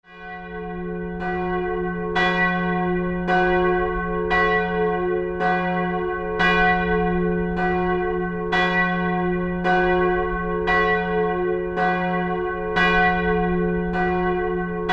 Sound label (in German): glocke